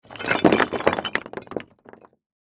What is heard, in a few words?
Crash Bricks Fall Drop; Debris; Materials; Sound Effects:Materials; Blastwave